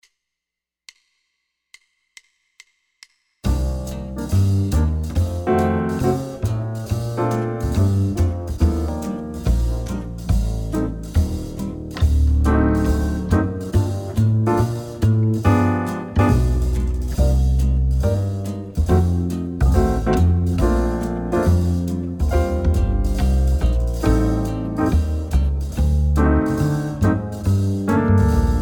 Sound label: 140 BPM BT G minor